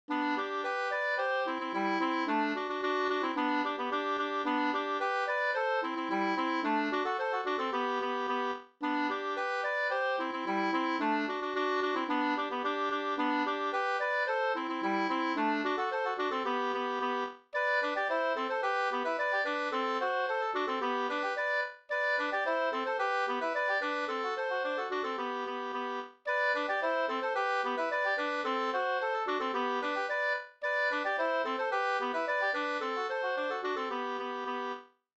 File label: polka